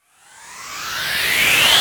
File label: Sweeps Medium